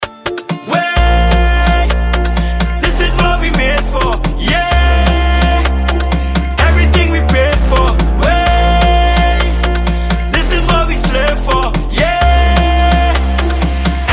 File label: soca